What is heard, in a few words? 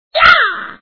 Voices - Yargh!; Mario